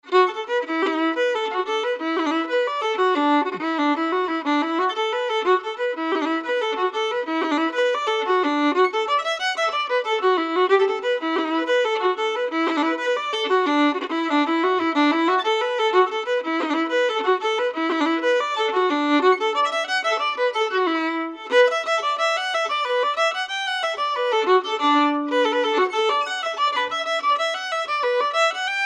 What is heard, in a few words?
Irish Mic